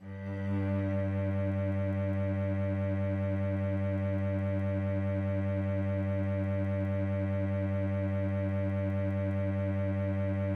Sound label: Cello G